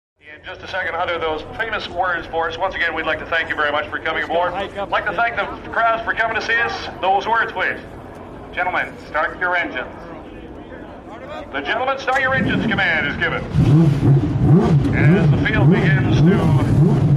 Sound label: Pro Sports Car Race